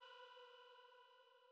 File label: Female Pad B3; KG MegaSaur